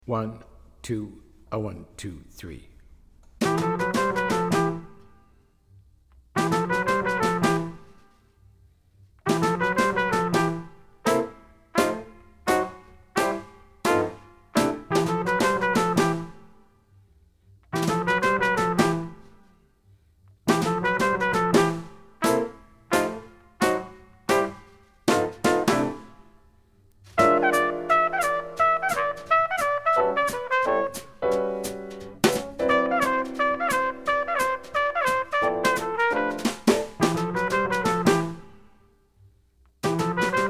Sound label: minus bass